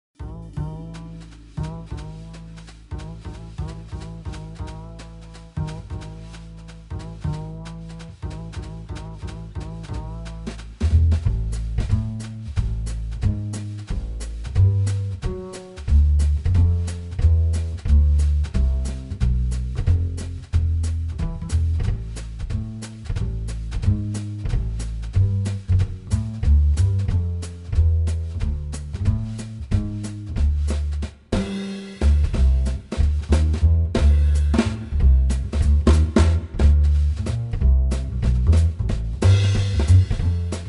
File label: valentine minus piano